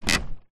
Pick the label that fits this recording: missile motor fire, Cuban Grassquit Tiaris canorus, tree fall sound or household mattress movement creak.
household mattress movement creak